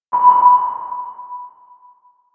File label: sonar ww2; hollywood